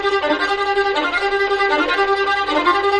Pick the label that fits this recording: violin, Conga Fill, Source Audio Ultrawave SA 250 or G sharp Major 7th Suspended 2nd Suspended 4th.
violin